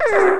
Oops Ou Down

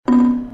bump

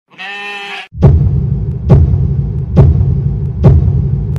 Ram Hit

